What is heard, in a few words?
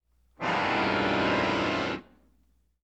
accordion; prosoundfx